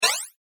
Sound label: multimedia max min interface sound sci fi max; Multimedia Interface ' Maximise ' Sound ( Sci - Fi Style Tone ) Ideal For Maximising Of Br; Tones; Multimedia ’Maximize’ Sound; Sound Design; McKinneySound